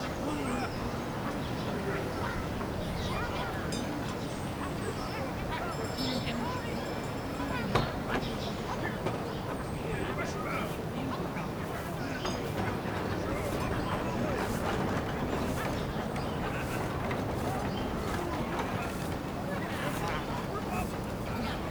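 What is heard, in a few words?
ambience dinners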